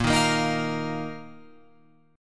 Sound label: Bb Suspended 4th Bb down Guitar Open F; Bbsus4 strummed